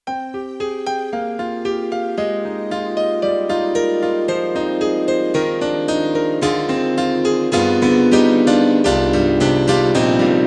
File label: YAMAHA MU1000EX, Piano and Strings